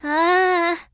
dizzy; tressa

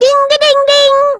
ding d-ding ding; usami and monomi

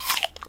eat apples

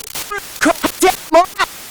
static

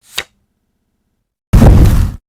burn card

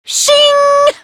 shing

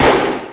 shock

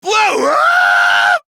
whoa uh; monokid

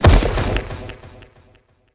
collapse; bobby

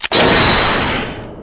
launcher objection; iris

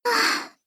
sigh

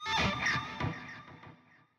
sweating metal; pees'lubn

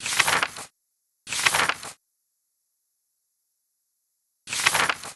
reading

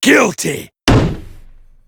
guilty

